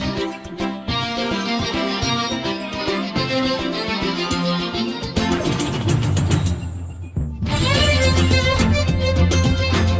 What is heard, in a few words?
Violin; MusicLDM2Melodiff